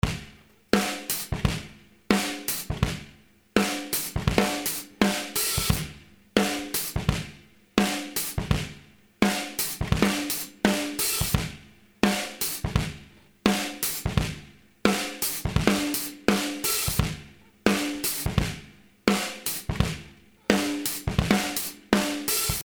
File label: 85bpm filtered